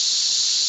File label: fuse; Worms3D